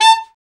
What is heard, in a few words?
BRA ALT, Alto Sax